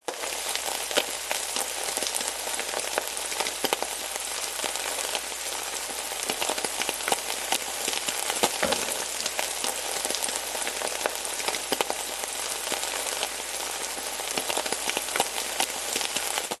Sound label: eggs frying omelette pan